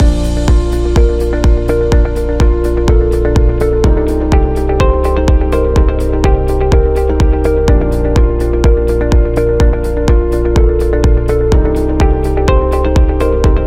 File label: Learning Loop